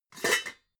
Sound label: pots clank soft